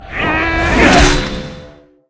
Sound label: dark warrior attack, monster